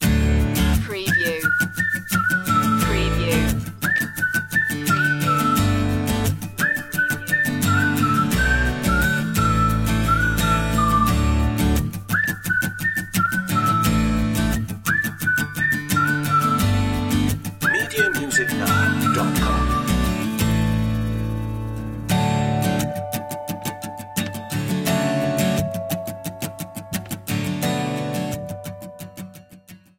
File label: whistle acoustic